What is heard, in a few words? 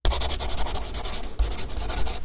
writing